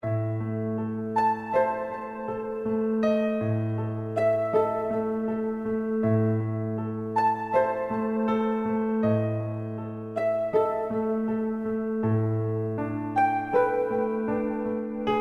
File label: Exploring Area; Julia: Innocent Eyes